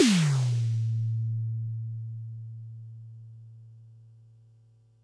snaredrum, Pearl SC-40

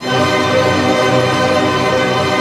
ARP F# MIN FF, STR ARP; Sound On Sound; Peter Siedleczek's Orchestra

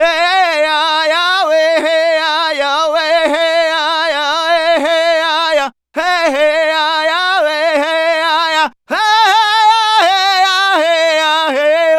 Native Vocals, Native American

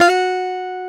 GUIT, Funky Gtr Licks; E-MU Formula 4000 Series Vol. 1; Hip Hop Nation

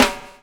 BUBL, BUBBLE; Spectrasonics - Backbeat

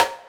SN room; EMU Orbit-3

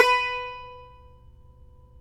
TAR; Roland - LA Composer vol. 3

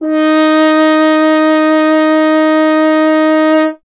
F. Horn; Miroslav Vitous; Solo Instruments VOL-2

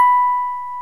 DIGITAL SYNTH; OMI - Universe of Sounds

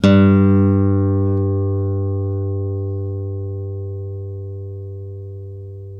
ACUSTC G 1, ACOUSTIC guitar; East West; Steve Stevens Guitar Samples Collection; ACOUSTIC PCK

